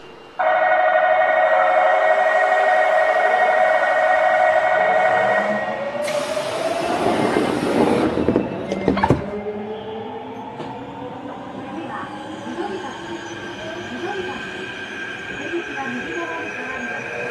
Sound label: vvvf, mitsubishi; kintetu 7021